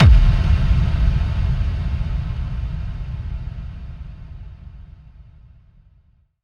Kick FX

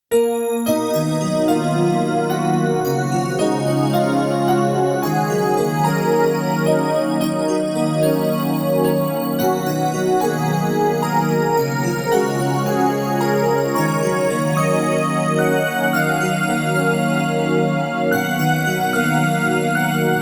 instrumental

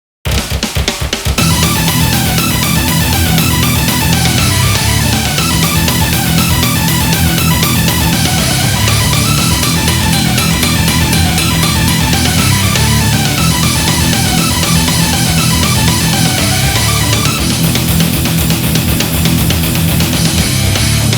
off vocal; 東方紅楼夢10

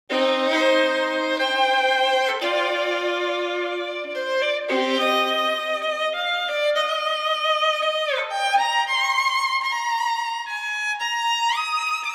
ViolinSolo

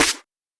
Snare, PsyTrance

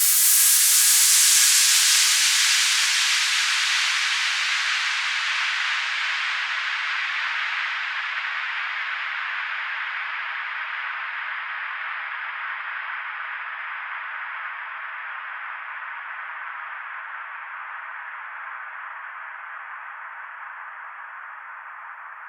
White FX

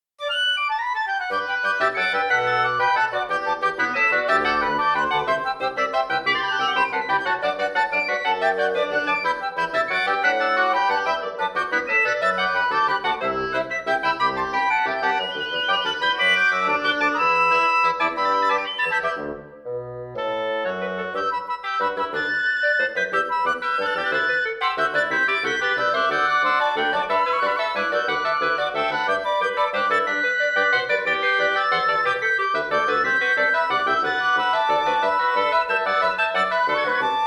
WoodWindQuintet; 例大祭15

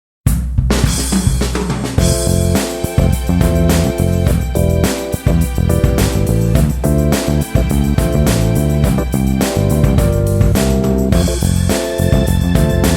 Swing Birds

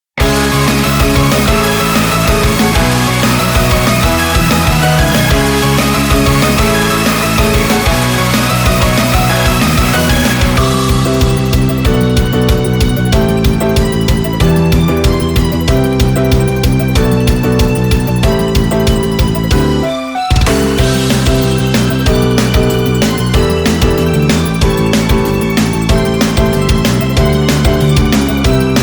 instrumental

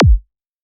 Kick; LiLA'c Records PsyTrance Sounds 01; LRP1 Kick11

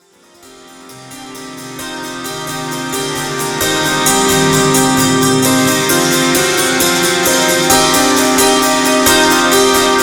Ukrainian Folk; Ukrainian Folk (2.0) for ''The Embodiment of Scarlet Devil''

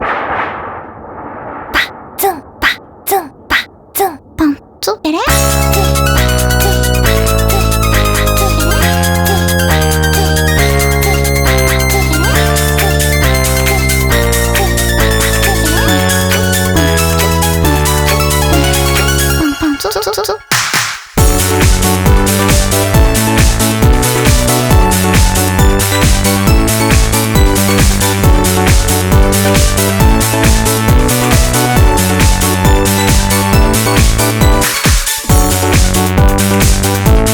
instrumental

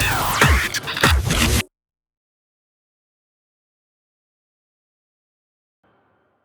FILL, PsyTrance

